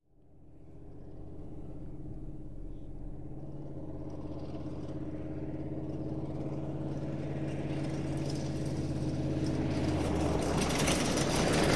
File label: Humvee In Skid To Stop